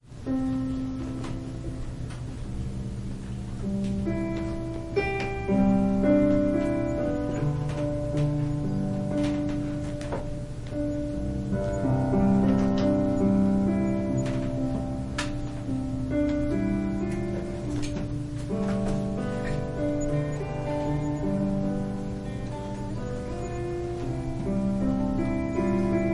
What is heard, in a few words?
Piano and guitar prelude